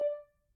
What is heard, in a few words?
Grand Piano Main Release D4, Kawai; Chordophones; Releases; Legacy